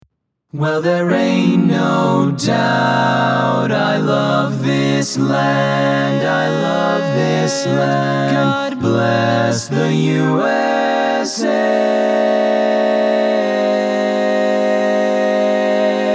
4pt acapp

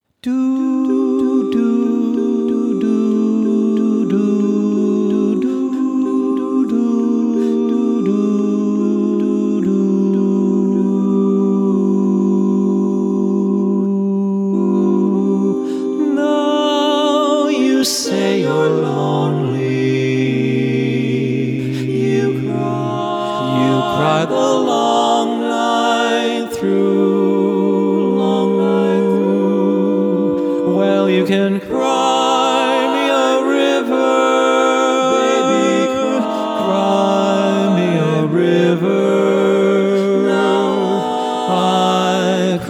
bass solo

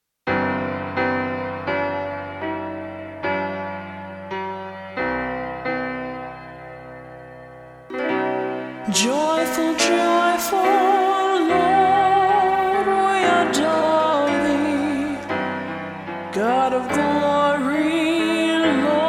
SATB

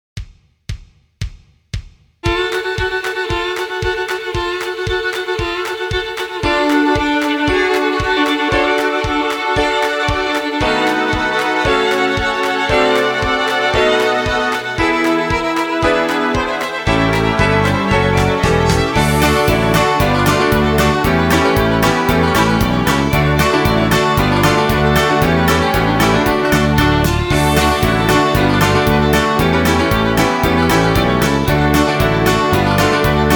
accomp track, Hoedown

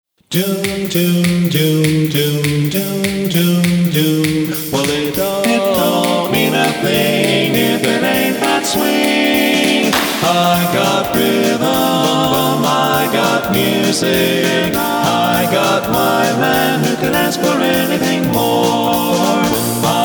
Rhythm Medley

